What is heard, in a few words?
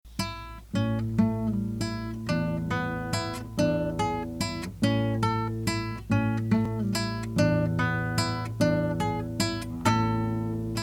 waltz